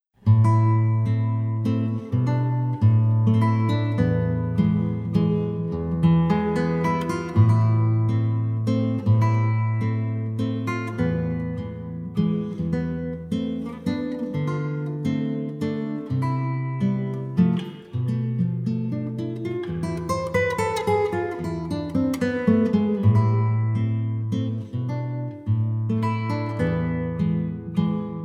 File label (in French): Valse pour cor guit